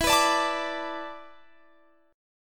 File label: E 7th Suspended 4th